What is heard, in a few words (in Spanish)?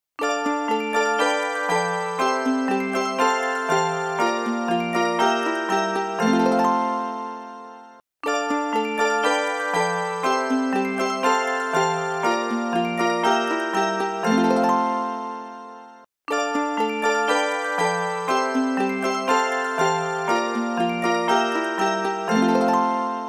sonido estacion